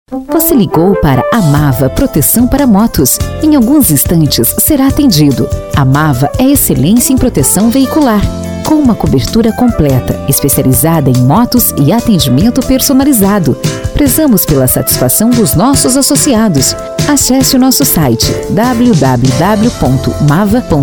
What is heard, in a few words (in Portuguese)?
Espera com trilha; Amava